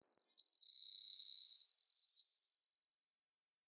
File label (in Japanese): キーン